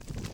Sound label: dream platform dissappear